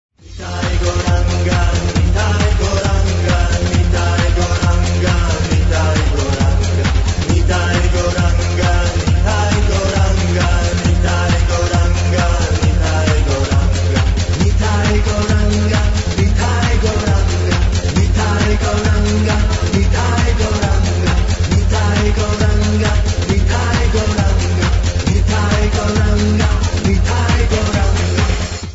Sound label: Techno